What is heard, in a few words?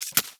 Micro Step Snow; Warframe; Corpus; Arachnoid Micro